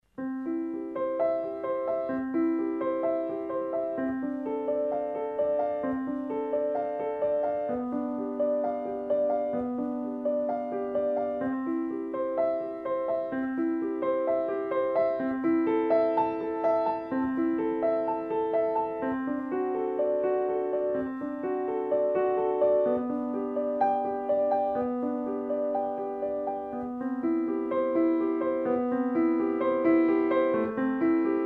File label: Prelude in C Major; Bach; Wedding